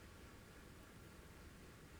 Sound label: pulses, BALAENOPTERA; MONACO 2022-07